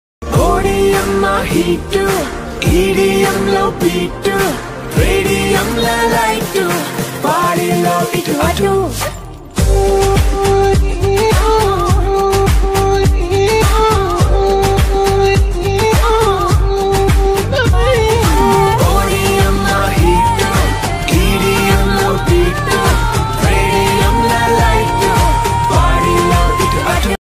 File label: telugu ringtone